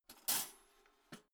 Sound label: toaster pull in soft